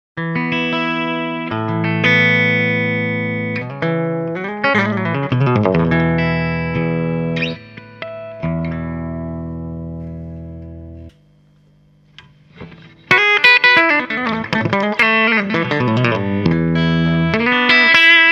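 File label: ByPass - Compressor active (No Rectifier No Color), guitar; SardineCan Demo1 : Part 1: ByPass - Part 2: Compressor active (No Rectifier No Color); Audio Demos using SardineCan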